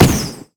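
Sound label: fireworks missile barrage; Fireworks Corki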